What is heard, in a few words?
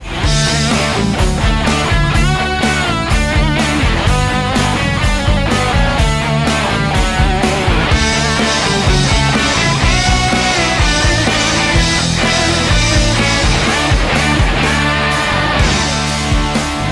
Guitar